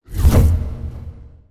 torch by oneshot

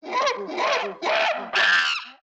monkey; ambience avant gardens

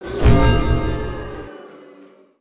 MINIGAME FAIL

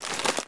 paper RUSTLE; aronwk collection; ndaudio

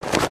shorter BACKPACK cloth; aronwk collection; ndaudio

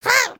Trex Excited Roar; creatures organic

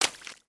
Foot Swamp Water Run, footsteps

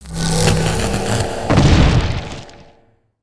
stone warrior spawn; creatures organic

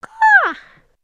Kitten Yawn; creatures organic

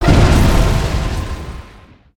Lightning Strike; Shinobi

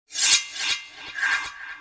Battle Area Scrape; gnarled forest

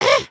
headspin, emotes

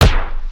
Gorilla Hit; creatures organic

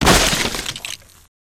Crates n Boxes, destructibles

